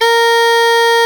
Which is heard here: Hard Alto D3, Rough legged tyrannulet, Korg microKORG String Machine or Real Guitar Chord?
Hard Alto D3